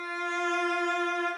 violin F4

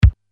kick

